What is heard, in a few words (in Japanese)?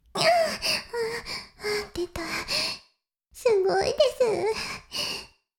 しこしこ音声, ASMR; 白くて黒い魔性円環